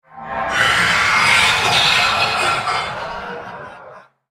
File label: ultimate effort; CyberNocturne